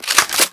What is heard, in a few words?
shotgun pump